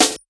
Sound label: snare w foley